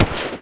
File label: machine gun; FalloutTower